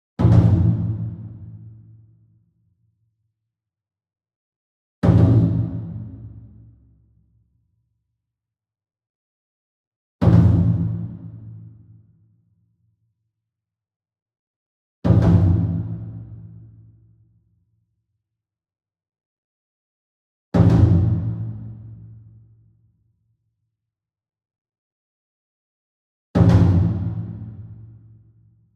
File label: SINGLE DRUM Taiko 28 Inch Double Soft Beater Far; boom library; Cinematic Strikes Construction Kit